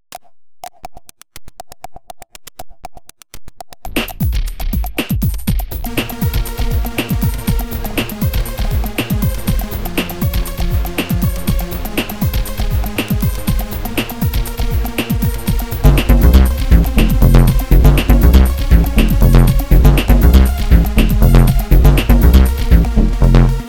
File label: Techno Remix